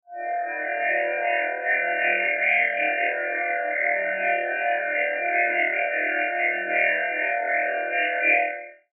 Sci Fi Robots Hum Warble

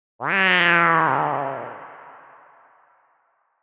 Spooky Cat, Scary spooky cat Meow.; Animals

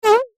Fart Squeak; Cartoon

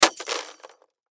Impact Chain Drop; Drops; Sound Effects:Objects; Blastwave

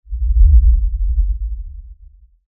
Lfe Rumble By Swell Low Bass Movement; Rumbles; Blastwave

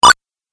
8 Bit Style Pick Up; Video Game Sound Effects

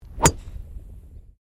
GOLF DRIVE DRIVER CHUNKY; Various Golf sounds; Sound Effects:Sports

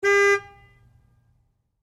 Truck GMC Savana Horn Short; 2010 GMC Savana; Trucks (Sound Effects:Vehicles); Big Room Sound

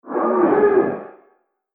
Crazed Monster; Monster Sound Effects; Fantasy Sound Effect; Andrew Fleming

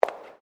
FOOTSTEPS WOMENS HIGH HEELS CONCRETE STEP

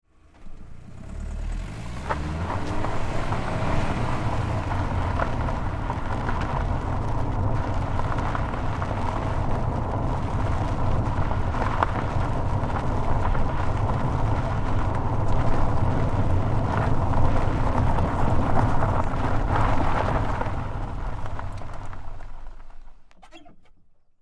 Car, Tire, Onboard, Gravel-Dirt, Roll, Med; Cars; Vehicles; Big Room Sound

